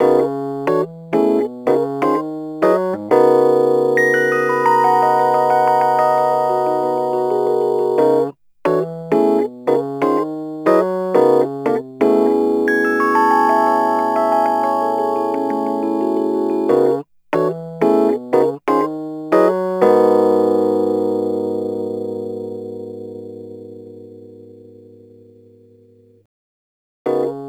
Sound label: Rhodes Attacks; Ueberschall